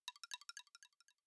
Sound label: Horror; super mario maker 2; Peaceful SFX Raws - Otoasobi Horror SE